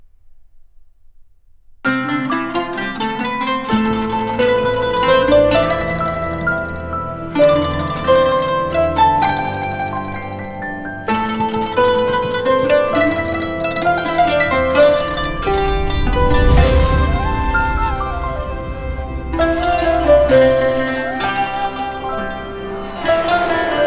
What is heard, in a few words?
Oriental Instruments; DCAA Oriental Instruments